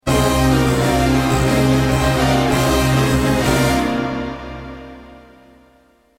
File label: Orch; d-50